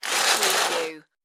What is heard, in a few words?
curtain slide